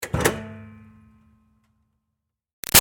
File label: Car Highlander Hood Pop Open; 2005 Toyota Highlander V6; Cars; Vehicles